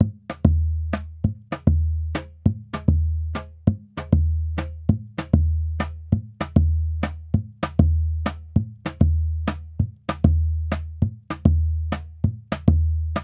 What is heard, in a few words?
baiao 98 zabumba; gen 04 stems samba baiao